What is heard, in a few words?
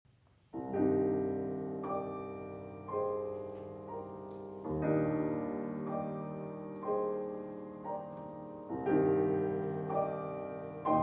Piano Prelude